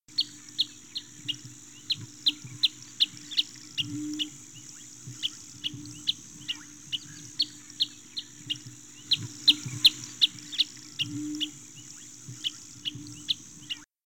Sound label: Green Sandpiper